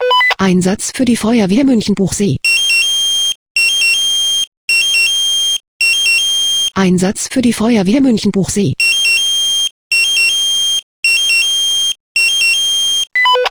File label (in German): SMS Alarmierungstone; FW Muenchenb